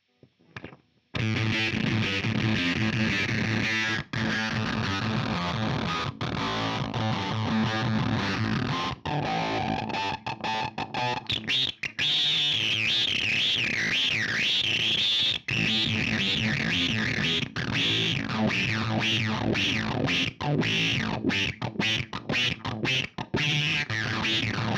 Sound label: walrus kangra free modern; Walrus Audio Kangra